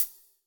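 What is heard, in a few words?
loon wail; Alesis D4; Cym; Wetter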